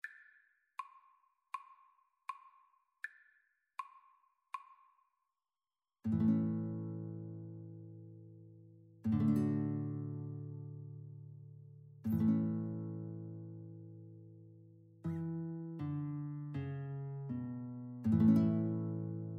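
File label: danny boy violin guitar karaoke; Violin-Guitar Duet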